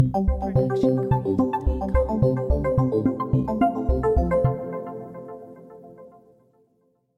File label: Friendly Synth Ident; SoundsCrate